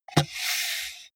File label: computer mouse draw drop